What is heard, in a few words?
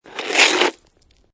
Snow And Ice Ice Scrape Slide; Weather